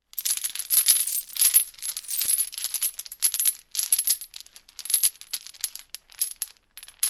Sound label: Metallic chains rattle and movements